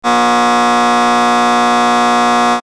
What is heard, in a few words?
xformer, equipmnt; KABOOM! - Wild and Wacky Sound Software